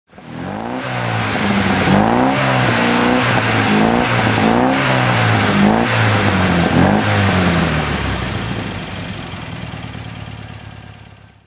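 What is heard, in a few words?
mgb throttle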